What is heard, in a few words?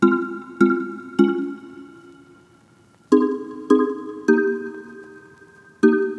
Happy Melody Loop - 155 BPM F Min Chords; Lead; Cymatics; Oracle Sample Pack